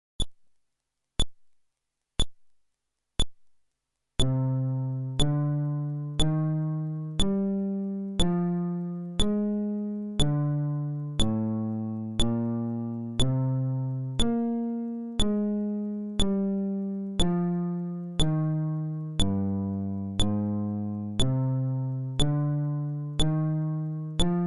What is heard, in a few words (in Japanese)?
フレットレス